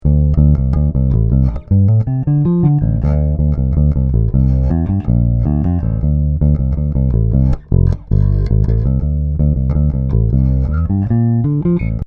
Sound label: ibanez artb100 ssb both, bass